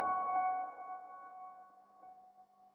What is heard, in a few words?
swap